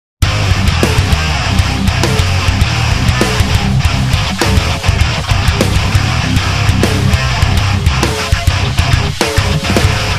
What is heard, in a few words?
superior test gm snare